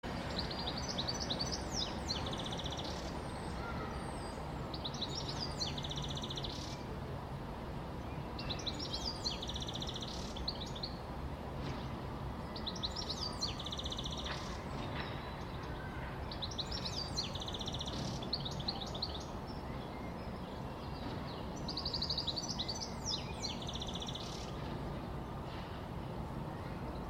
beautiful and complex birdsong competing with traffic on the morning